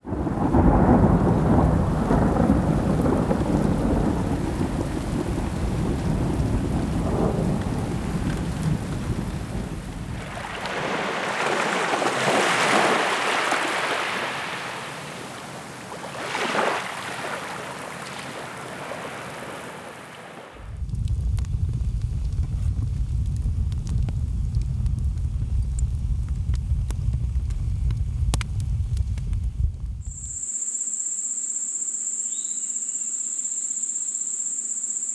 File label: epic nature loops sound fx